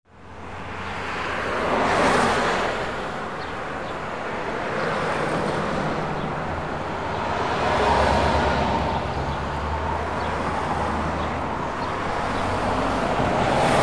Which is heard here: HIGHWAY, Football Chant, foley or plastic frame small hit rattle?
HIGHWAY